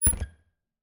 Refridgerator, old fashioned, wooden, steel, brass clasps, hinges, close, squeek, piercing, wide; Eiravaein Works; Vaeyan IV